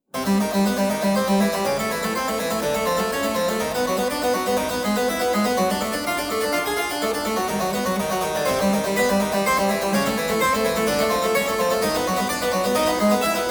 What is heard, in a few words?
Ostinato